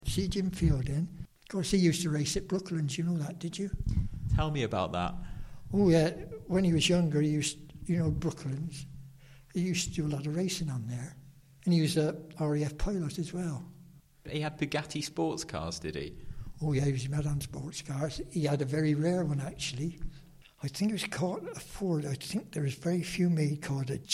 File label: Organ